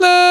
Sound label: VOX LOO F5, Scats Choir; 90s Sample CDs; Club-50 - Foundations Roland; Short Scats